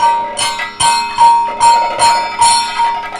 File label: KATAK, Indian Traditions